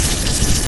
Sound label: Artificer Forge; A Robot Named Fight